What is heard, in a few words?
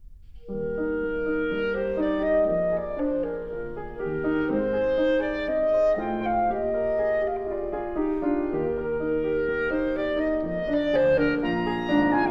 Trio in E flat for Clarinet, Viola, and Piano, Allegretto; Allegretto-Rondo; 'Kegelstadt'; Bruch Trio